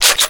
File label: 12ga shotgun pump; weapons; ModernWarfare